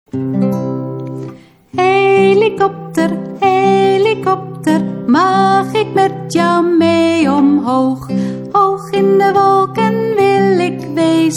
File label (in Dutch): helikopter